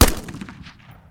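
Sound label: scar, weapons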